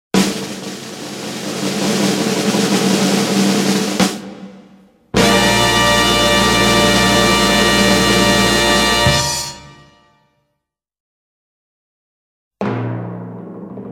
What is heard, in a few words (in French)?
roulement de tambour; a statue sur son socle